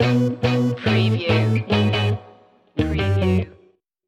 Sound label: old school detroit stinger